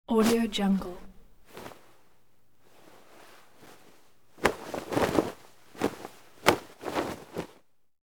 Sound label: bed sheet movements